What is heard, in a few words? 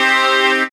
SYN, dance synth